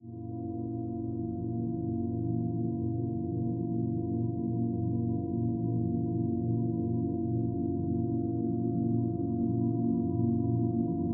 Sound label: Ambient